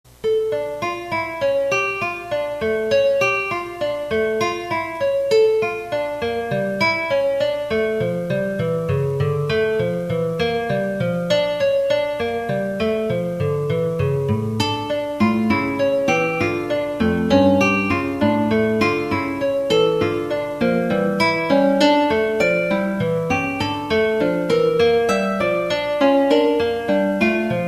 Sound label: Bach Guitar; I Love Bach